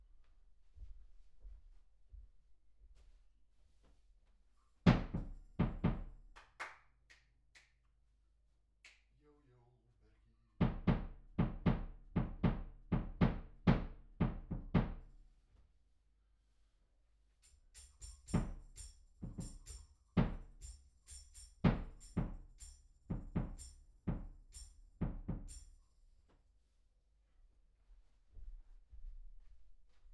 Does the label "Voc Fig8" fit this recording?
Yes